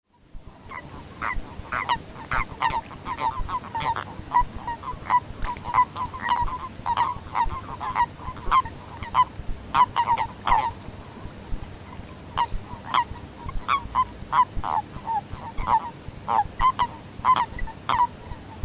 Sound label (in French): crapaud commun